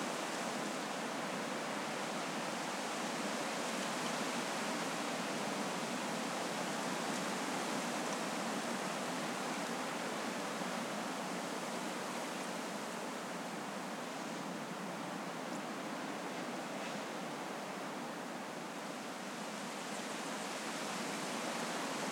Wind rear; CS Level3

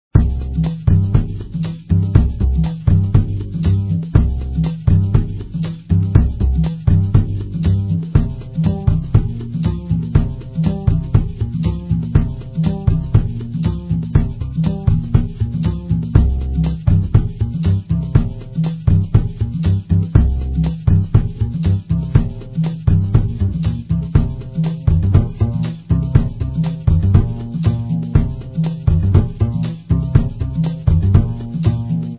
Theme Beat; Feed Fribbit Colors

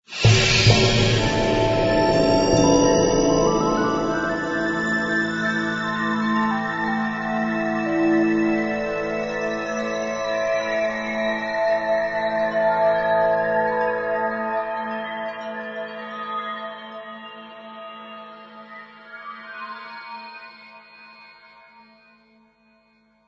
Music Final

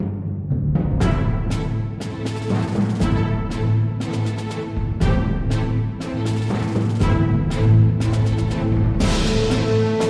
Marching On

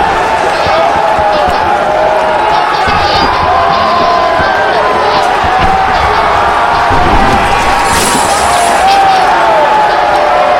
Looping Combat

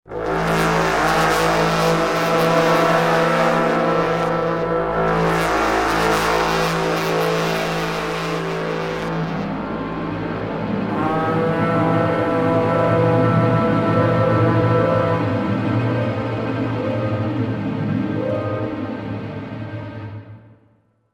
Horns of Battle

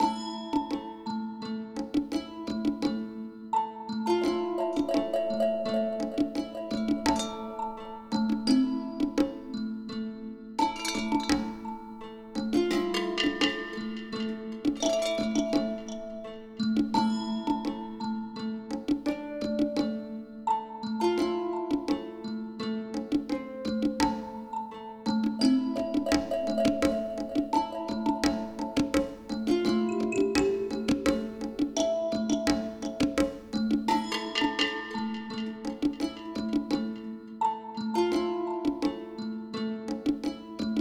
Music The Bazaar; Knights & Guns

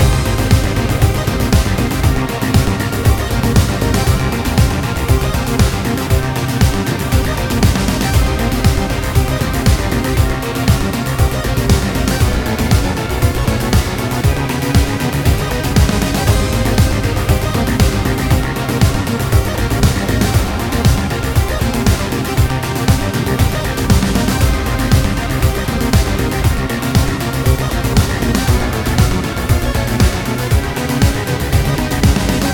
BGM Police Boss

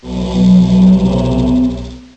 SWORD; legend of wolf windows 1997

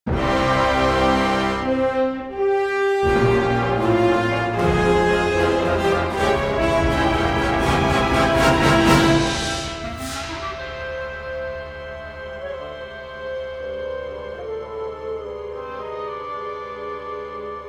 Dragon Motif; Indiana Jones and the Emperor's Tomb; Xbox

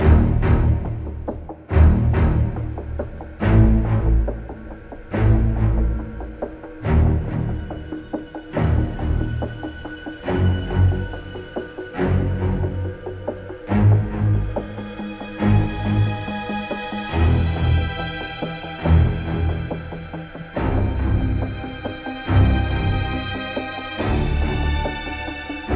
Exploring Night Loop

